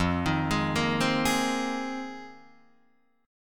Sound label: F Minor 11th, Guitar DADGAD